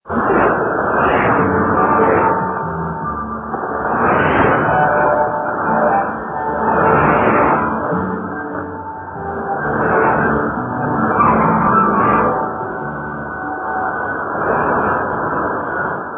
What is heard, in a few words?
Channel Africa 3345 kHz